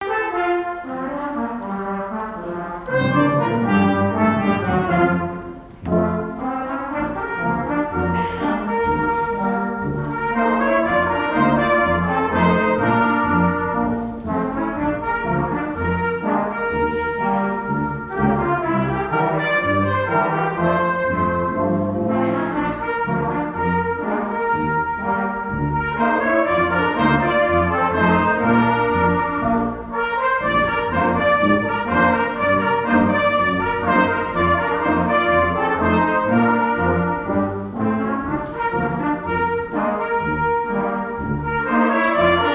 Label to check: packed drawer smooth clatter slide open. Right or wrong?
Wrong